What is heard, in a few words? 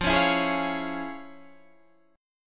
F Minor Major 7th Sharp 5th Guitar Half Step Down